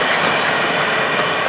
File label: AIR EXPLO; Greytsounds VOL-1